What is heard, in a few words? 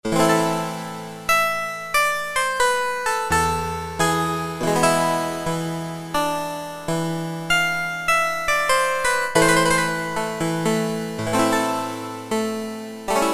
Harpsi, Roland MT-32